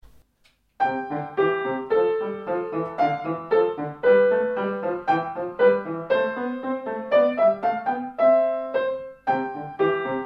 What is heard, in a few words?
Gavotte